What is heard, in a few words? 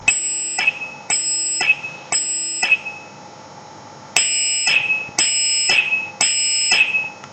Intermittent